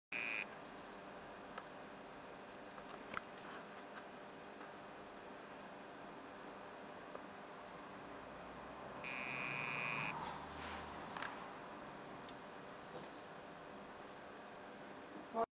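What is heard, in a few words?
D. t. citronella calling, frog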